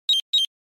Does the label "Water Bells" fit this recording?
No